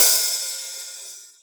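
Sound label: gated overhead, Drums Acoustic; USB Soundscan vol.10; AKAI; 90s sample CD; GATEKIT 3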